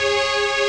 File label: annoy strings; ST-XX Amiga Soundtracker Sample Packs